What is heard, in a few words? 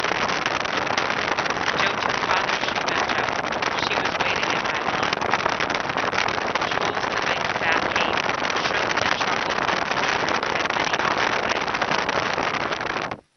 hikers story 15mph wind no filter; mckaudio